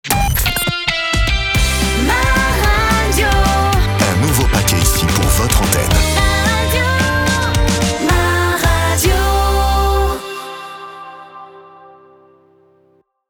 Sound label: jingle; MA RADIO AC VOL.2